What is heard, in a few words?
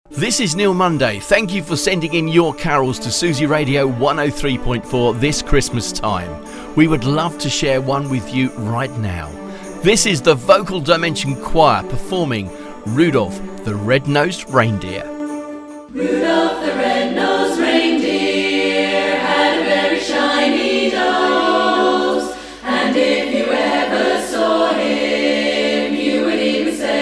Choir, inc intro